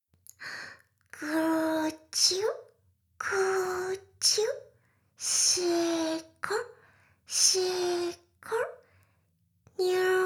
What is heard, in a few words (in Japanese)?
シコシコ音声; 魔法少女マスカリマッスル